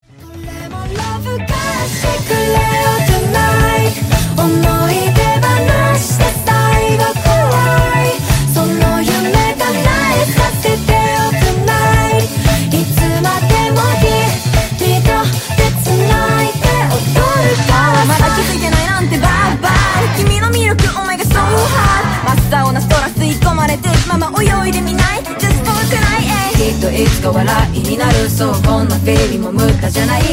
Tremolo; Chilli Beans

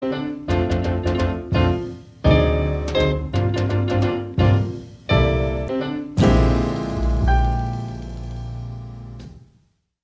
Jazz Romance

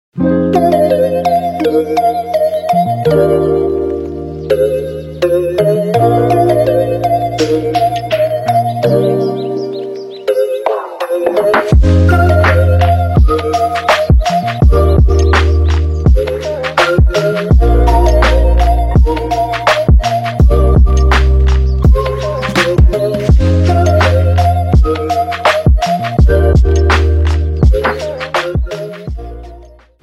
Lofi Opening

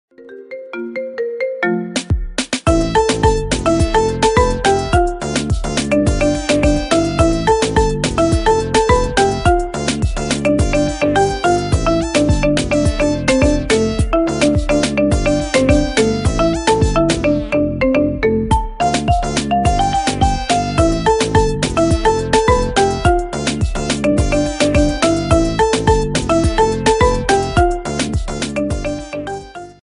Marimba remix

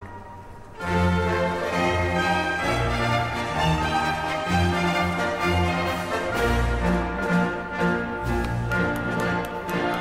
Italian Anthem

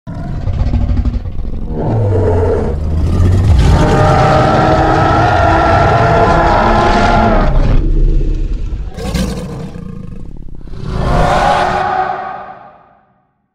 T.REX ROAR

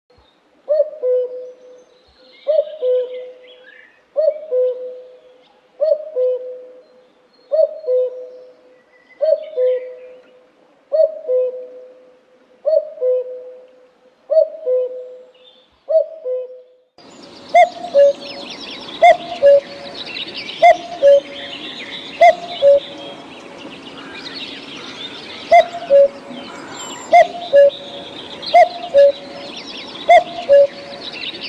Common Cuckoo

